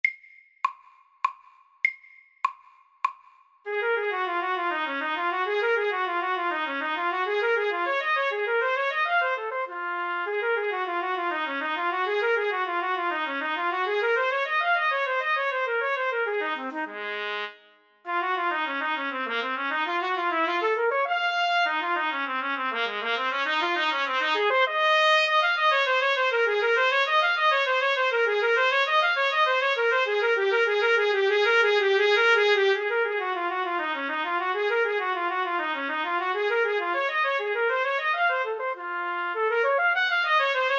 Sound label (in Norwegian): Etyde i Ess dur FORT; Bohme